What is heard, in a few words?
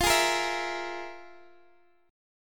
F Minor Major 7th Double Flat 5th, F, Guitar, Open D; x,x,15,14,13,14